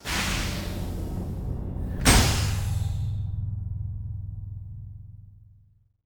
Machine; ambience

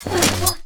war damage

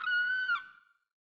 Gull, ambience

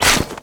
Axe cloth, Damage

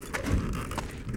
WAR WALK, Mob

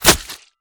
Spear Skin, Damage

